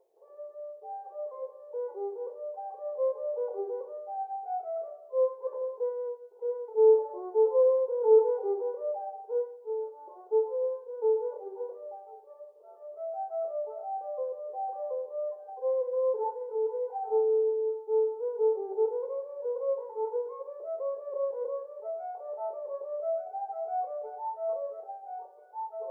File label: Woman violin sample